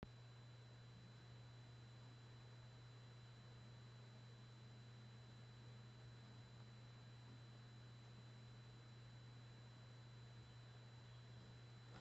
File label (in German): nuPro x3000 piepen